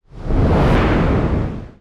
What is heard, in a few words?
flame burst